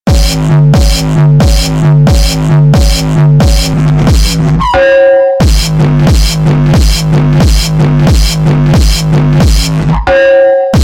Loud Music

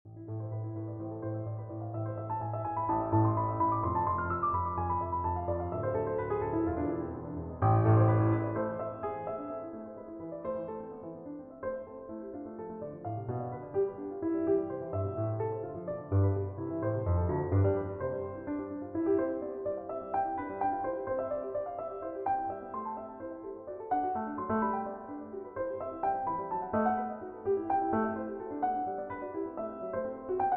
Invention in a Minor

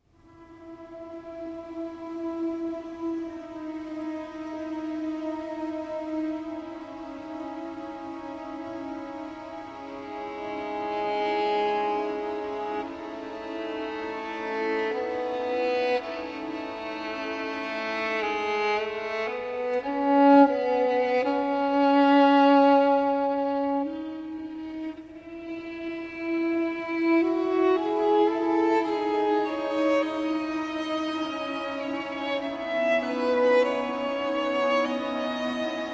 violin and string orchestra; Vasks Vientulais Engelis Meditation

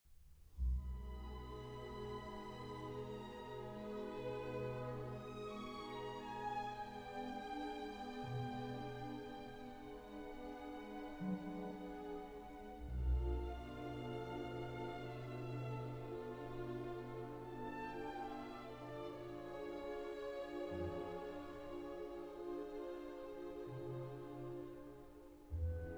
Piano Concerto in D-Flat Major, Andante Assai; Prokofiev; Piano Concerto No. 1

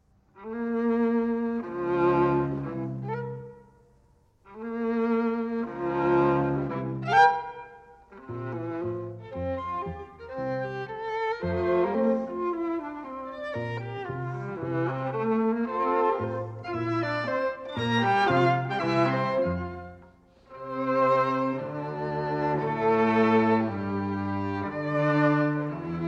String Quartet in F Major, Allegretto

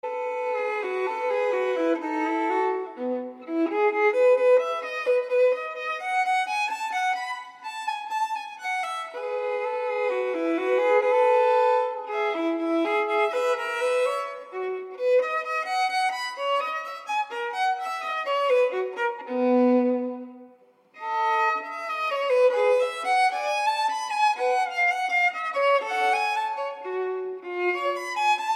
Violin Partita in E Major, Menuet; No. 3; BWV 1006

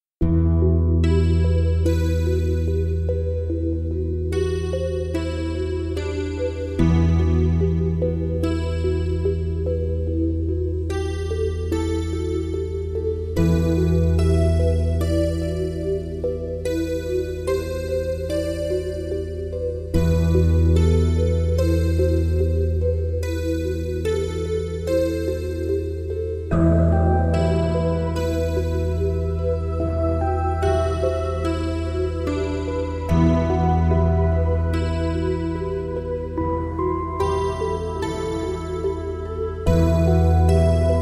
Ambient Space Music

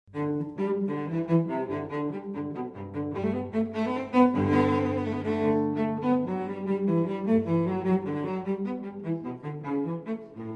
Cello Suite in G Major Gigue; Cello Suite No. 1 in G Major, BWV 1007; VI. Gigue

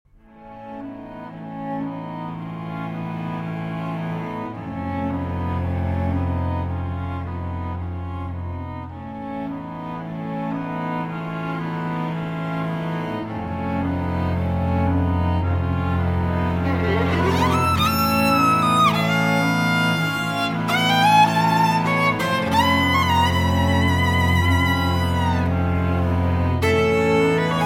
String Quartet; No. 1 Pictures From Warsaw VII. Crush

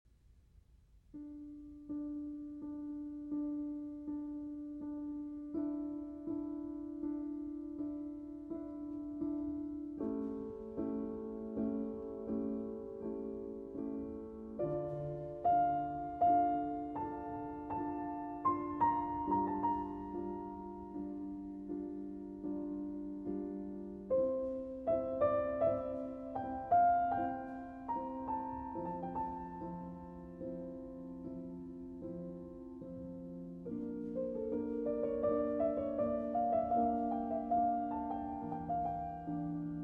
Concerto in D Minor, Adagio; BWV 974 II.

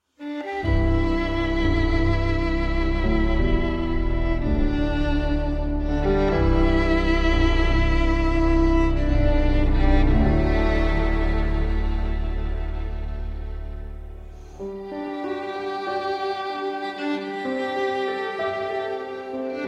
Arr. For Violin & Piano

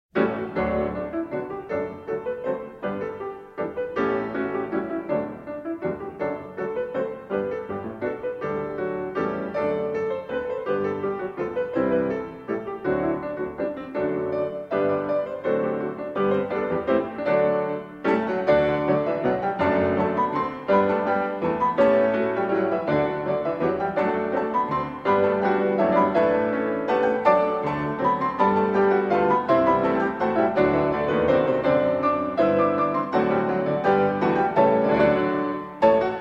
Version For Two pianos, Four ha; As Sally Sat A-Weeping; Two pianos, Four hands

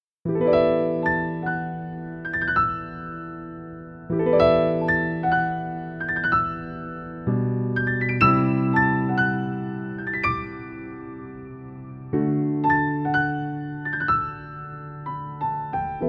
Piano Version

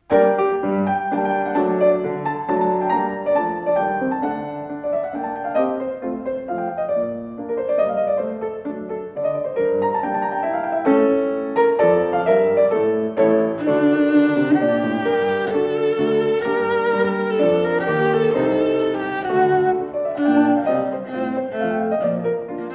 Cello Sonata in G Minor, Allegro; (After Oboe Concerto No. 3, HWV 287)

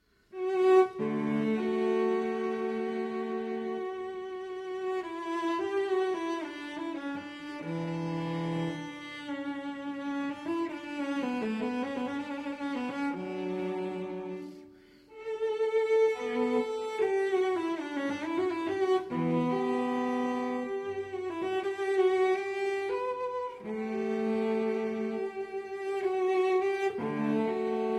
Cello Suite in D Major Allemande; Cello Suite No. 6 in D Major, BWV 1012; II. Allemande

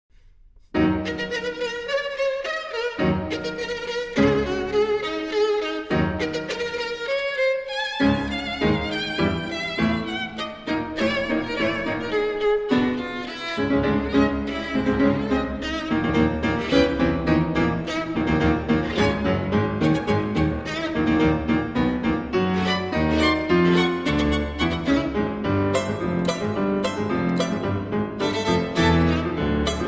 Sonata For Violin and Piano Allegretto

